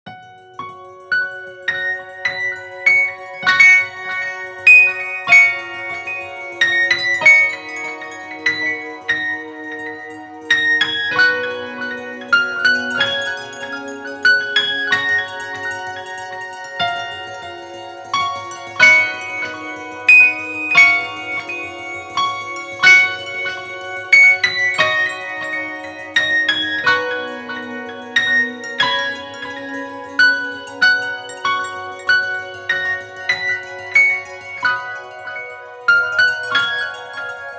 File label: scratch